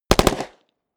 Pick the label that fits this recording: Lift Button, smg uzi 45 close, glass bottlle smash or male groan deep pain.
smg uzi 45 close